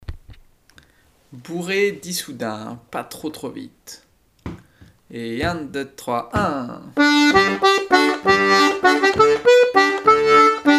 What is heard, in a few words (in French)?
bourrée Issoudun (3 tps)